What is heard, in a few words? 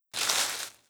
grass Footsteps; voxelverse